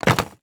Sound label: Sports Ball Golf Ball Dropping Into Golf Bag Pocket